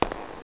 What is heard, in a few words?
tile